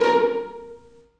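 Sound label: 1st violins pizzicato A#4; Sonatina Symphonic Orchestra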